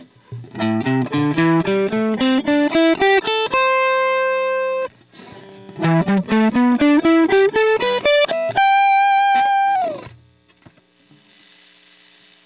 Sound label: minor pentatonic, guitar